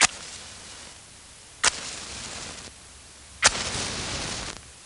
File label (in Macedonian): kibrit palenje